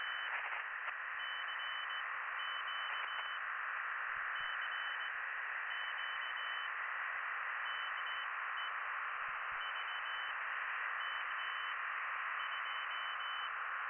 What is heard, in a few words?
from radio, 7177KHz